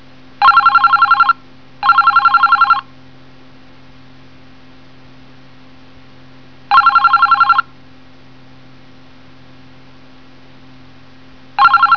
TELEFON

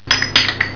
klapper

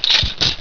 BESTECK

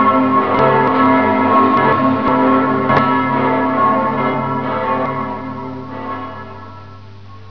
Glocken

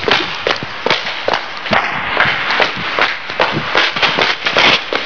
Schritt

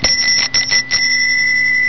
klingel

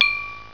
klirr

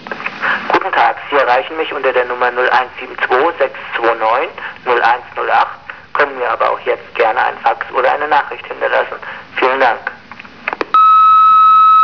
ANRUF

